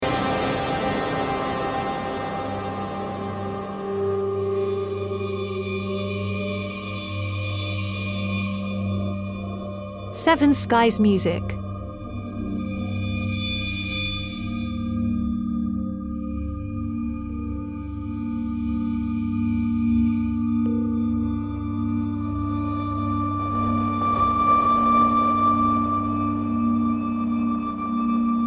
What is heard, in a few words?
Ambient Dark Film Series